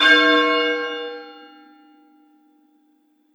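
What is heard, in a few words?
bell old style; cccbr; glossop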